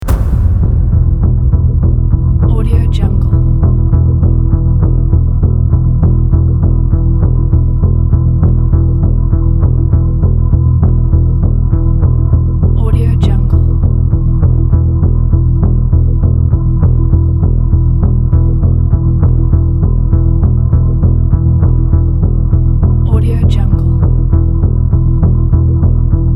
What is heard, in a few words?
pulse drone; boomopera-sfx